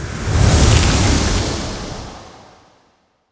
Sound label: reload, weapons; soulreaperex; cstrike